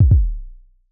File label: Health Critical